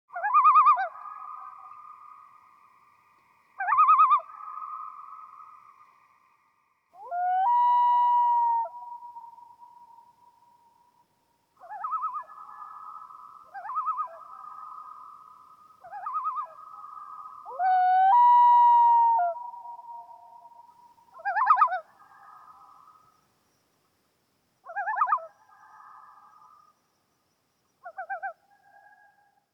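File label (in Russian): loon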